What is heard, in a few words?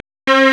STRNG; CMP - Analogon